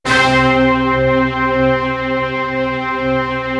orchestra; East West; Global Sounds

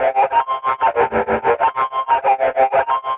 TUVAN PULS, TUVAN FUZZ; Spectrasonic Distorted Reality 1

